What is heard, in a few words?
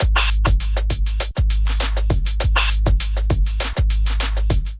Groove 100 bpm, Drums; MIDI Clips; Full Lotus; Ableton; Factory Packs; Chop and Swing